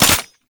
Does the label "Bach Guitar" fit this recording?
No